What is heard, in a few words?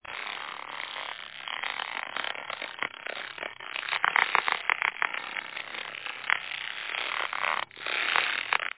rope tight